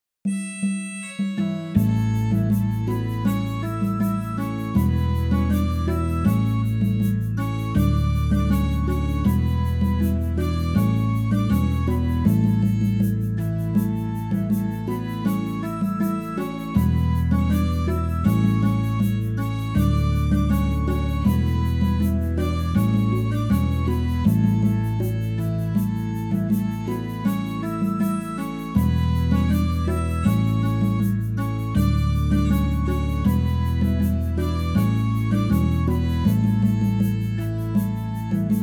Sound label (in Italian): Biodiversita Strumentale